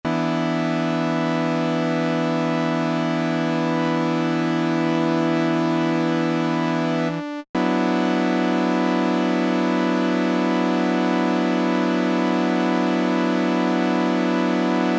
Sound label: Chords